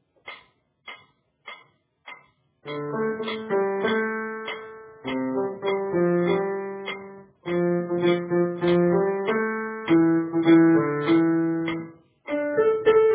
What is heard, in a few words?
Alto